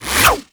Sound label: Baton Whoosh; Warframe; Lasrian Soldiers; Weapons